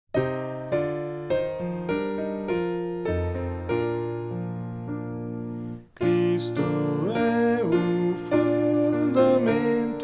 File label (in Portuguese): voz piano